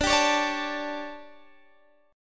D Major 7th Suspended 4th, Guitar, Open D

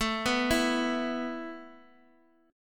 E 5th A; E5/A arpeggiated; JGuitar

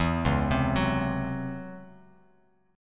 Db Minor Major 11th Db, Bass Standard; arpeggiated; JGuitar

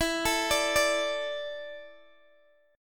E 7th Suspended 4th, Guitar, Open G

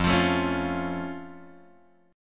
F Augmented F; F+ Chord {1 X 3 2 2 1} strummed

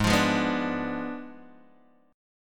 G sharp Diminished 7th G sharp, Guitar Open D

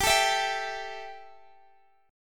G 6th Suspended 2nd; G6sus2 strummed

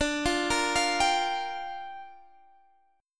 G Minor 7th, D

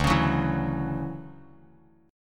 D-Major 9th D down Bass Standard; DM9; strummed; JGuitar

